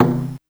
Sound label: Perc Wadaiko Casio SA-1